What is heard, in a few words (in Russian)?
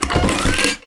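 отвратительный скрип старых ржавых петель на двери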